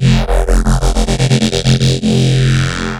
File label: Wobble F